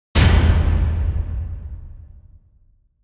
Grave Strike; Gothic Storm Music

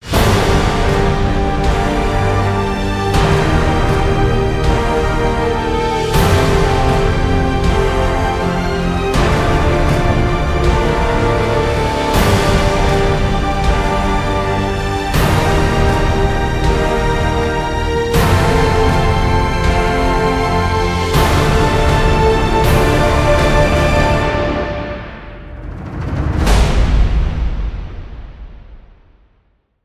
No Choir

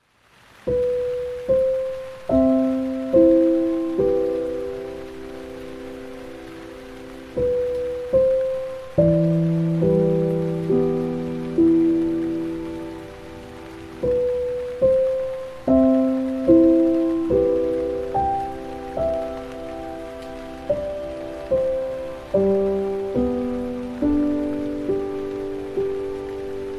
Piano (With Rain)

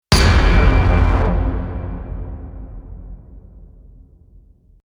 Hit Metal; Ninja Tracks

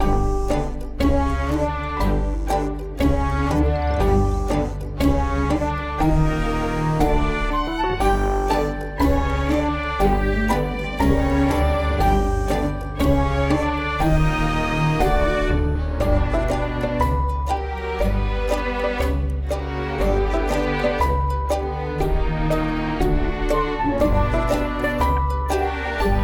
Idiot Ambient

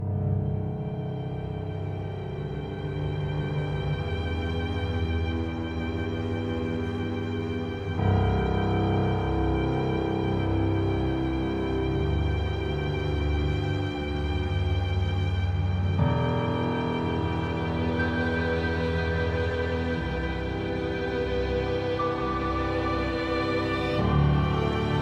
Deepening Ambient

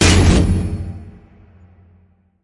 Shotgun Hit Metal

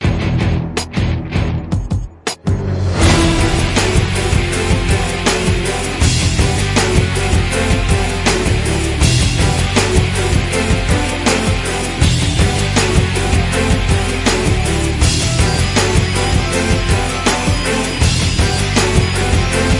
Without Choir

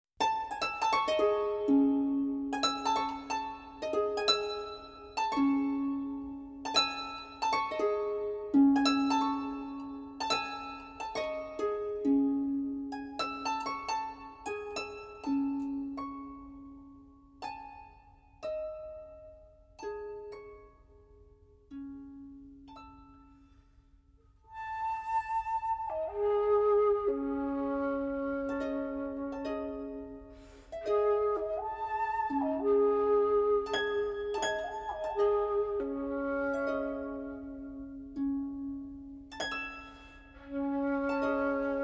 (Arr. For Harp)

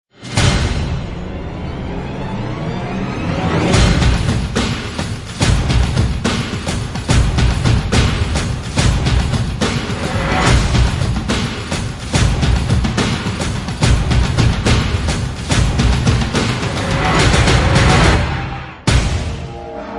Secret Agent Drums

